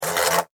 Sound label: Wasp Fly Wing, Wasp, Fly, Wings, Land, Buzz, Short, Insect, Bug